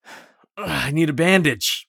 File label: bleed in; Escape from Tarkov